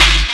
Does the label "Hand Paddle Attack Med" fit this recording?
No